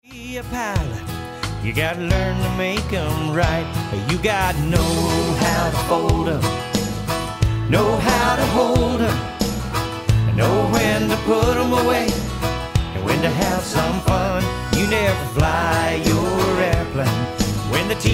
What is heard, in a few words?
Half Time medium tempo